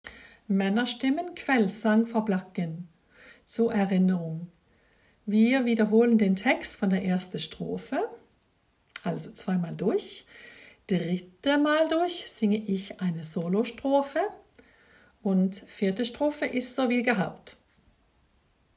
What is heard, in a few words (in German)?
Männerstimmen Kveldssang for Blakken